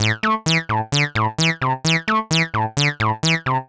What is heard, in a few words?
303 overdrive